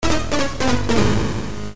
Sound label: Death Jingle; Foxyland 2